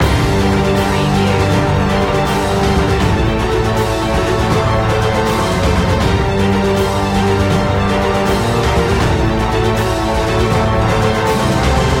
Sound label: land loop